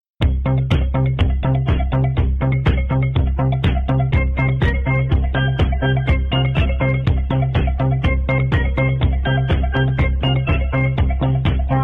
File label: chip loop